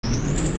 Door Mechanical Open; zelda; OOT

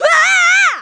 Taunt Crazy; Toon Link; Link's crazy sword-swinging taunt; Zelda

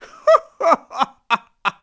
Laugh; Sturgeon laughing; zelda sounds

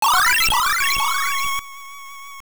Get Power Up; Get a power-up (Guardian Acorn, Piece of Power)

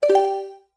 Options Close; Open and close the Options menu (Recorded)

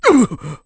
Sumo Grabbed; Sumo match: Bo grabbed by Link

